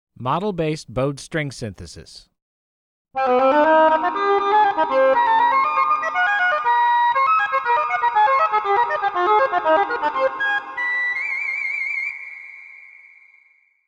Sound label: Bowed String Synthesis